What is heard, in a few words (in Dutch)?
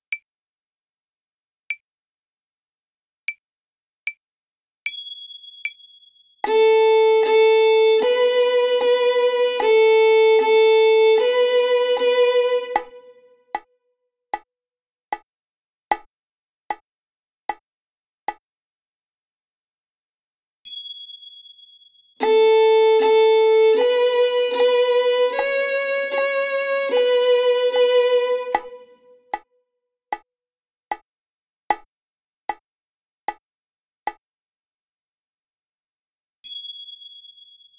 Imitatiespel A snaar Viool